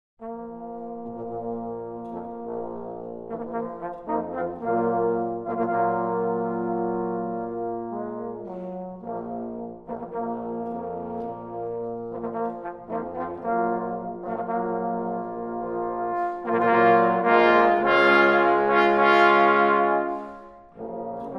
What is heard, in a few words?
suite for trombone quartet; 2012 senior musical comp; mvt i